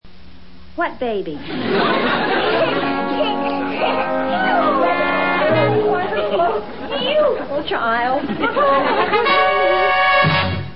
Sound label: music